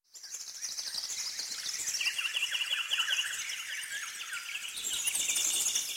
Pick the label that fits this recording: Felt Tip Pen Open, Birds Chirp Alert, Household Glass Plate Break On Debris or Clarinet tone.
Birds Chirp Alert